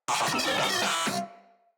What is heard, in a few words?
Moa Vocal Enemy Spotted